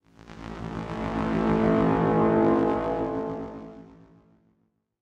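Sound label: oracle ambience; assassin s creed chronicles india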